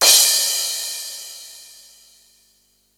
crash, Yamaha RY-8

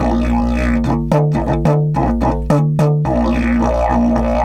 108, Didgeridoo

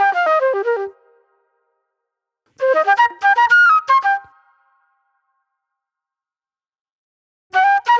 Fast Funk Flute

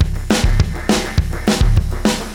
fx, Beat Loops, 203; Zero-G - Monster Beats

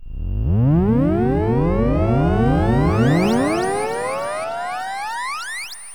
TAKEOFF, Anime Sound FX, SCIFI; AKAI Anime Sound FX

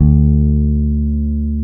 FEND P-BASS D2

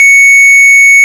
ORG Farfisa

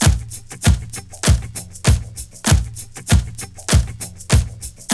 SLOW; CONSTRUCT 4

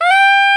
SOP SCP G 79, SOPRANO SAX; Northstar - New Gold VOL-1

